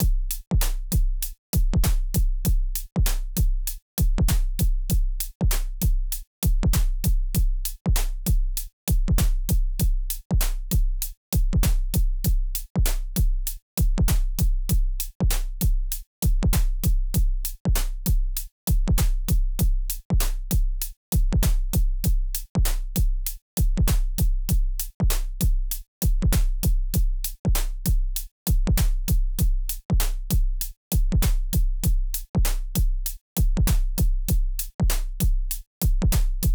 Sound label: Eventide H9000 Drums Original